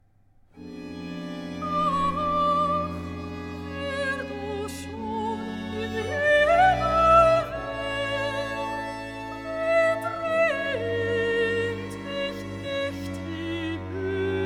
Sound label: Recitativ; BWV 146; Wir Muessen Durch Viel Truebsal In Das Reich Gottes Eingehen; Hélas!; KantJubilate